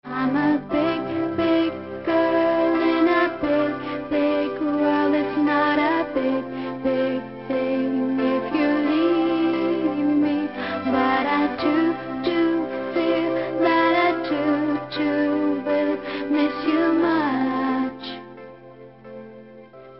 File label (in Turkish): santral fon muzigi